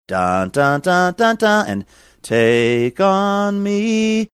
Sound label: singing opening notes